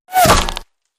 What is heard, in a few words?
Bullet Impacts Wood I